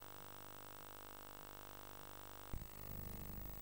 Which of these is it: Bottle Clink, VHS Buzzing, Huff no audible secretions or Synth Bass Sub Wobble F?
VHS Buzzing